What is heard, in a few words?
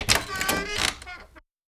open door